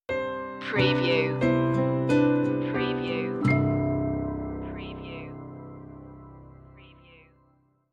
whisper of raindrops stinger

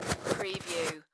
Baseball Glove Insert; sound effects bible

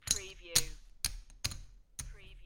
Poker Chips Stack Chips On Top Of Each Other

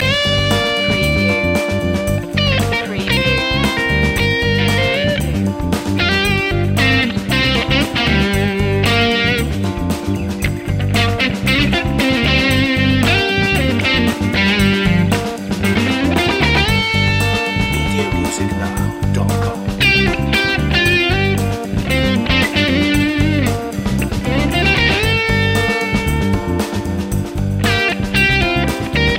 cocktail funk loop

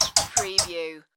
Tui Kiss

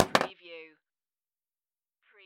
Pinball sound, ball drops

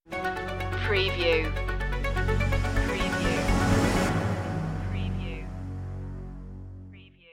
machine land stinger

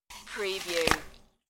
Apple Bite

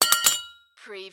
Cartoon Bell Hit

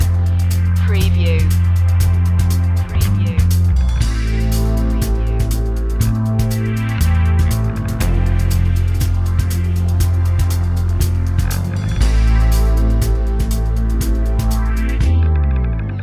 funky time loop

